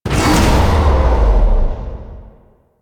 Rally Cast Release; Warframe; Warframes; Hoplite

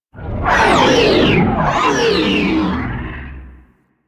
Sentient Ship Attack Maneuver; Warframe; DE; Vehicles; RailJack; RailJackEnemyShips; Space Fighter Snt Coil Agent

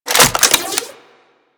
Reload, Weapons; Latron Prime

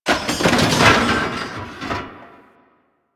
Desktop Metal Destroy; ambience; Warframe; Entrati

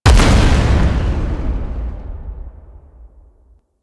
Delivery Key Explode; Warframe; Nineteeen Ninety Nine; PvPvE

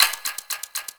Hack Minigame UI Time Tick H

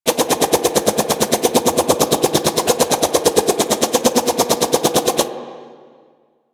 Melee Pet Projectile, weapons; DE; Warframe; Sentinel; Crp Melee Pet

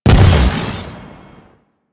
Shockwave Grapple Explosion; Warframe; Venus; Venus Terra Shockwave Moa; Abilities

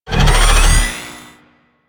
Mech Sword Heavy Charge; Nechro

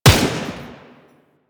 Tommy Gun Shot; Weapons; Warframe; Tenno

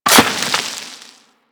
Melee Impact Dirt; Warframe; Weapons

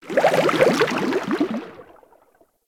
idle bubble shutdown; Warframe; Sharkwing; Vehicles

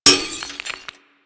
Glass Impact; Warframe

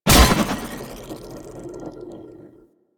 Board Sword Catch; Sundial

